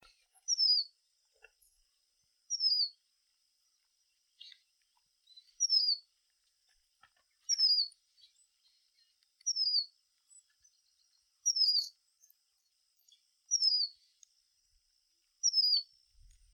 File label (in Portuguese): Canto do Bengali vermelho